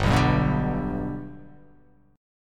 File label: G Major G down, chord; G strummed; JGuitar